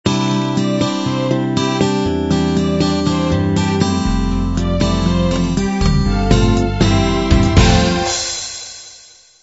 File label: standard 8 beat intro